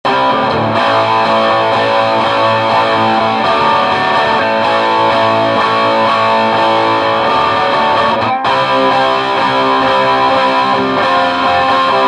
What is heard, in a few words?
Rockin Rhythm, guitar